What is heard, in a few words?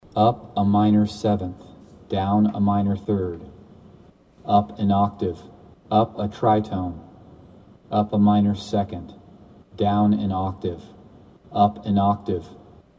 Random Intervals